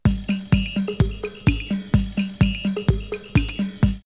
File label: Beat 127, drumloops Techno House; Slave Beat; X-Static Goldmine